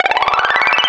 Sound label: count up loop